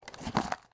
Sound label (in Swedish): papper